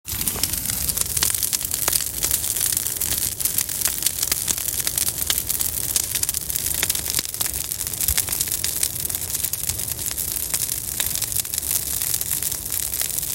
Wild Fire Large

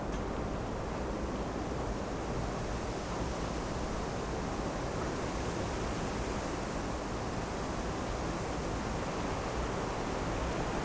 Wind

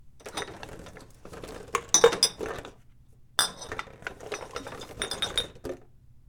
Bottles and cans

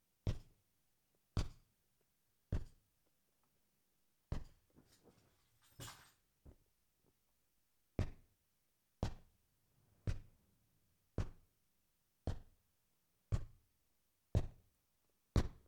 Hitting Couch

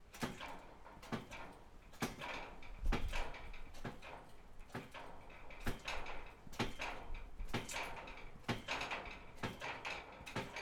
Bouncing On Trampoline

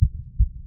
Heartbeat Dumpf Dumpf

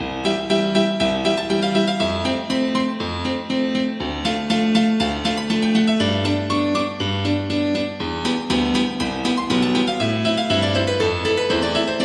Piano Game Theme Loop

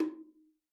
Bongo high

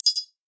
Spacey Cricket Click

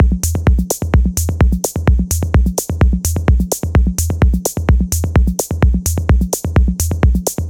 130 Bpm Deep Techno Sequence

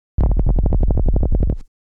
Texture Minimal

